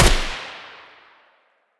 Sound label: muzzle g36 mono distant